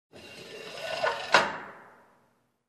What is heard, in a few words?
Sliding metal door close